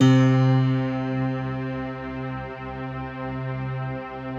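bright electric piano strings, Slow Octave Piano Strings; Optical Media International - Sonic Images Library; Slopiastring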